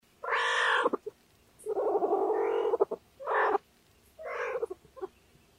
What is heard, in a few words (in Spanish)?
guina